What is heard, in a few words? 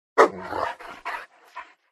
dog demon attack voice middle